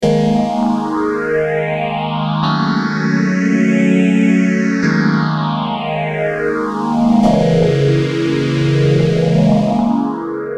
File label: m1000 phase II